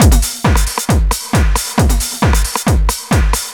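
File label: drum loop 135, right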